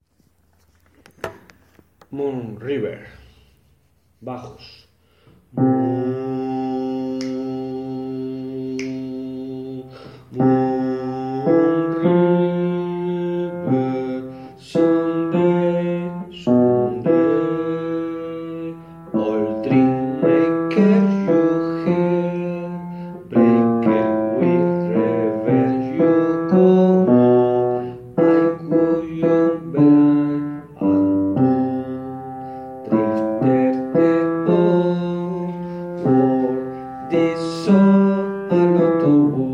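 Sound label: bass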